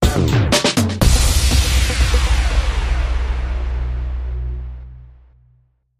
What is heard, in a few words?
Tutti Flutey